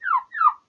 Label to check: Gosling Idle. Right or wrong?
Right